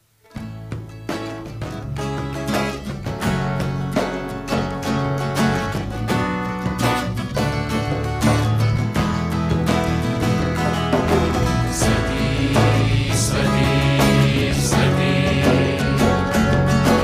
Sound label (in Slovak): Piatkova omsa - Hosana